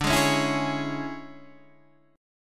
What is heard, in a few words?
C sharp Minor D, chord; strummed; JGuitar